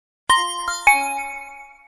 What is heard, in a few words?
truecaller sms tone htc